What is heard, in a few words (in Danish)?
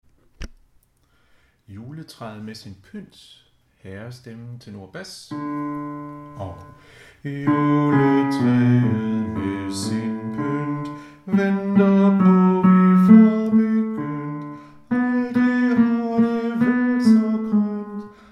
Juletræet med sin pynt; harlev koret